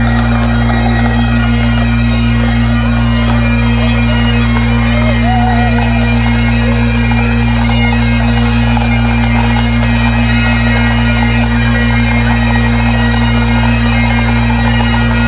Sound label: raw Bb sine; Space Pipes